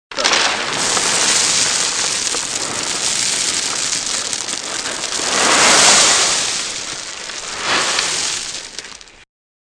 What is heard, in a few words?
Sound of gravel pouring out of a metal wheelbarrow.; Wheelbarrow Gravel Dump; Yard Tool Sound Effects; Sound Effects:Tools